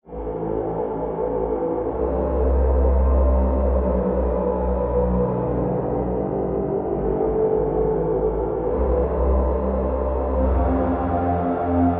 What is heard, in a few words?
Pad; Routed Sound; Spire Expansion - Chaos Bringer Vol.1